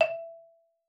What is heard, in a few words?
MARIMBA E5; Xsample VOL-4